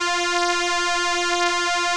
ELKA, String Machines; ELKA RHAP

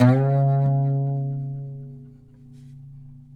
PLK OUD, Roland LA Composer

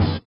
SWUSH; Spectrasonics; Metamorphosis

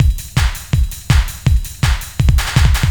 LOOP, Eurotech; Sounds Good

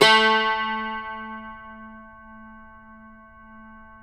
CUMBUS, OUD; Roland - LA Composer vol. 3

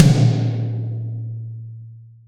toms, Real Mega Drums; Best Service; Real Mega Drums VOL-1

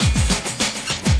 loop, 200 BPM; Ueberschall; Drum & Bass Resonance

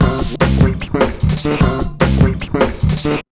150, Big Beat Drumloops

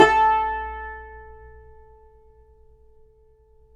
yayli tambur

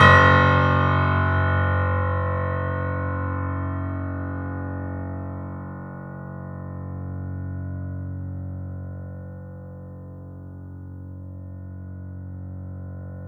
F#, piano sustain

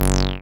TB G2; Best Service Gigapack 1; SYNTB303

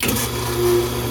grease disposal startup

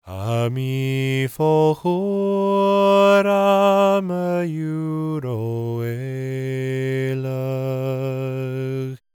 GAELIC, World Voices; ILIO; Vocal Planet

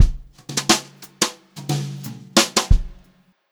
jazzy fill, Brushed Drum Loops; USB Soundscan vol.39; AKAI; 90 s Sample CDs

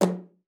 F#3, alto sax; Xsample VOL-2

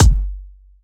Kik; Rawcutz - Nu Forms

